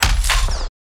transition shutter; Alexander Kopeikin; Transitions HD

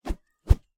Whooshing Low Double; InspectorJ; 96 General Library

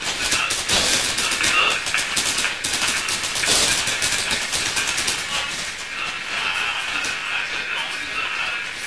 Office; hl2

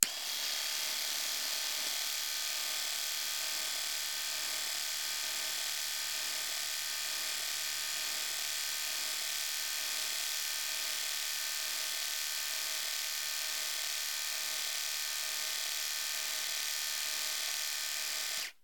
Razor, Electric, Norelco, On, Run And Off; UberDuo; The Bathroom Audio Playset

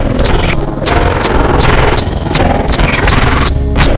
power machine, ambient objects; hl2

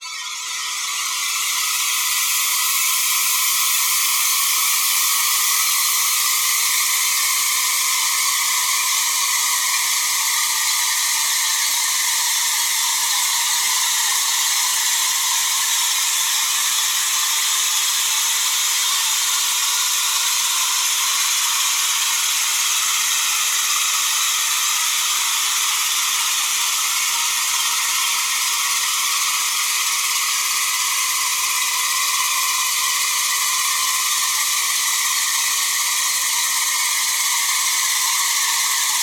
Dark Ambience Pad High Screaming Swarm; Systematic Sound; Tonal Elements Atrox; Game Audio Bundle

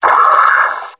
die, combine soldier; hl2

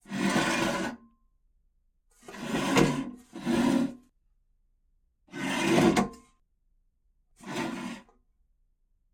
Chair, Fiberglas, Metal Legs, Slide, Tile, Series; Household

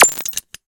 sci fi weapon texture; BluezoneCorp

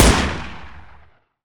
npc smg1 fire; hl2

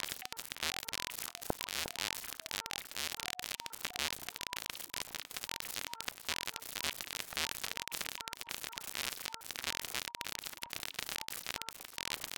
Rhythmic Clicking, Sci-Fi Interface; Sonic Bat; Sci-Fi Interface Construction Kit Vol. II

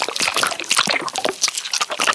stress, antlion grub; hl2

